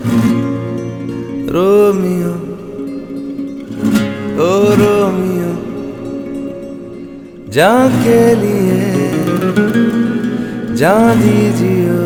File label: Ringtone, Announcement Poster Audio; ORomeo Movie